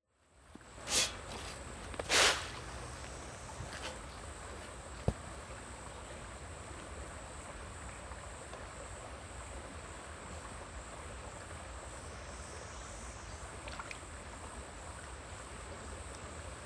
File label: fish feeding